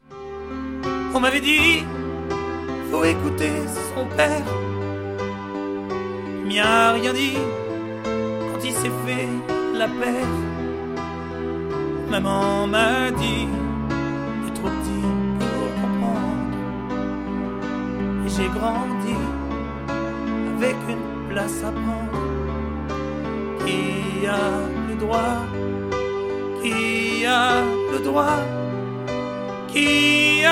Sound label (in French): chant; Imitation